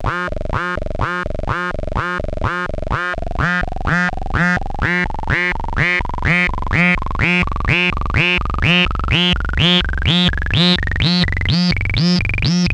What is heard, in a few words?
mudulation acid 5 D, free techno acid samples